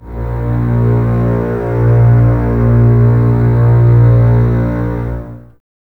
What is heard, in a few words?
Basses A#1, String Ensembles; Miroslav Vitous; 90 s Sample CDs